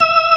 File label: ORG, Organs